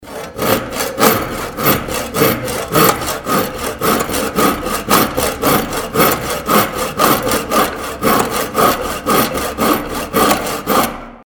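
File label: sound of saw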